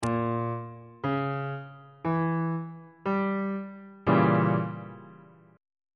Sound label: e diminished 7th 2nd inversion on bass clef